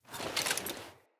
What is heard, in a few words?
Foley Walk; Friendly; Jotar